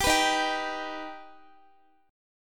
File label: Eb Augmented Eb, Guitar; strummed; x,x,17,16,15,14